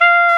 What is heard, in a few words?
SAX XSAX, Saxophones; All Saxes